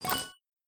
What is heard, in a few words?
coins